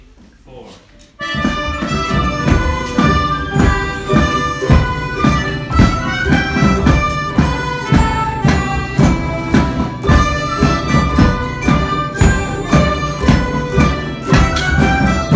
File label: Sweeneys Polka Band